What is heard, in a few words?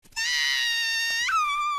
scream english; lucy heartfilia